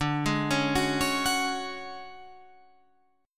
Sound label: D Major 7th Flat 5th Guitar Open F, chord; arpeggiated; 14,15,13,13,16,13